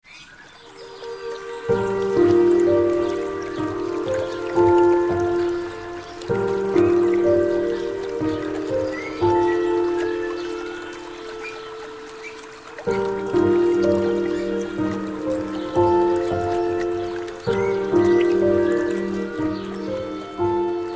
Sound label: Samsung Galaxy S3 Alarm